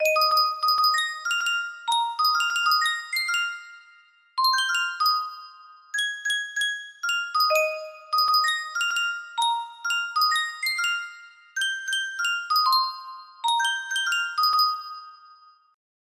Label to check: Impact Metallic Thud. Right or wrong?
Wrong